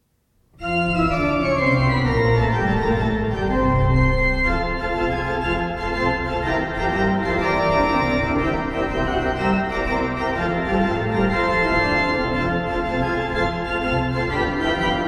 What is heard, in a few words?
Liberty Bell